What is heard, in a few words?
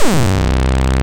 SAW SYNC; Analog Odyssey